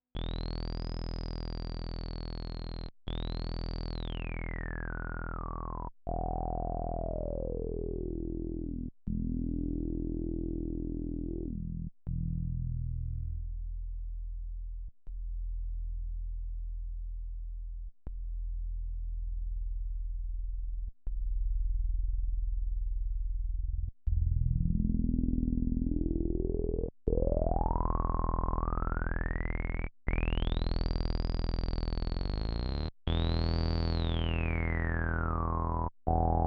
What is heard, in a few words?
SQR Filter Reso Sat; Korg Nubass